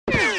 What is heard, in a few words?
bullet; Alien-Galaxy-War